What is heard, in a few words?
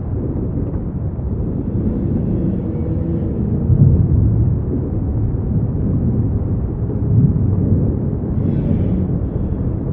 Interior; Duke Nukem Forever